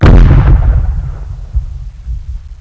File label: thunder cannon; CD Action 18b 1997; netstorm